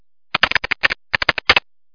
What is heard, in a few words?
keyboard